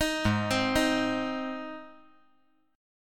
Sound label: Ab Major Ab; arpeggiated; JGuitar